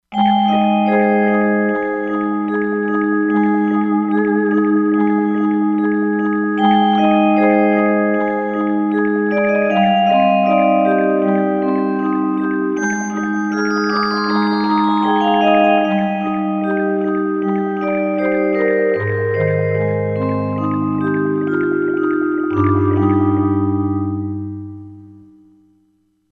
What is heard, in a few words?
Water Vibe; Korg MSC-16S Environment